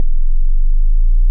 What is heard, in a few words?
sweep